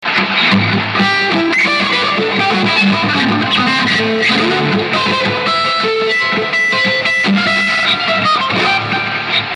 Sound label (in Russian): Играем на гитаре